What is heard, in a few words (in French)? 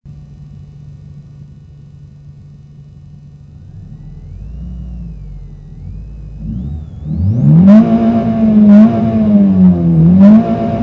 ralenti